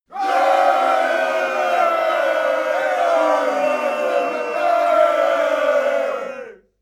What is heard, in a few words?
battle cry